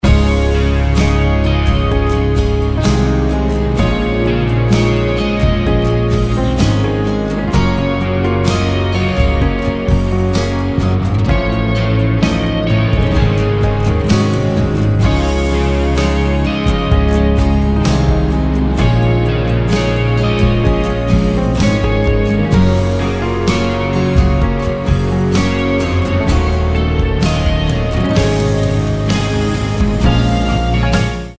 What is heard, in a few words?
Instrumental